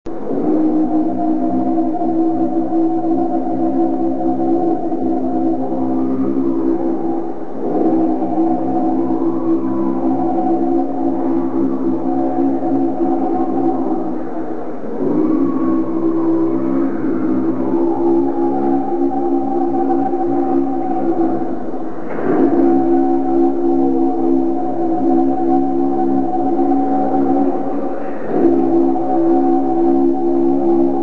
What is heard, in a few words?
monk chant; india